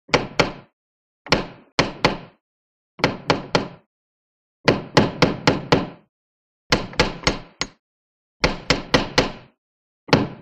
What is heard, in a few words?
Brass Knocker, knocks